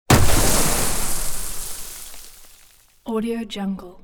matter mayhem debris small blowup heap of earth close; soundmorph